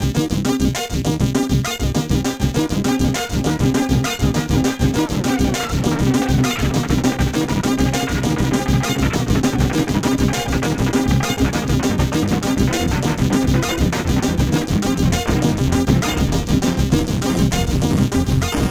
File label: Synth Pitch Automations